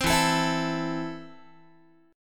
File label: E Suspended 4th Sharp 5th, Guitar, Esus4#5 chord; strummed; JGuitar